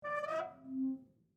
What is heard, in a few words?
Safe Door Open, Office, Large, Metal, Squeak; Metal Door Sound Effects; BlastWave